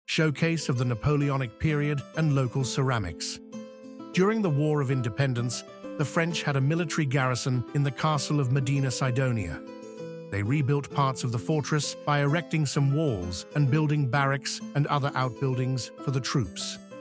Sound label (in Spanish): música; archaeological museum audio guide